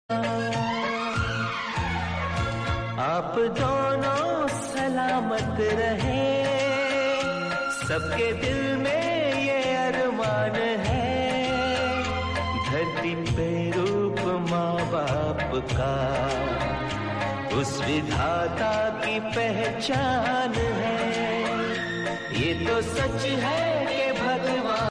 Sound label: Female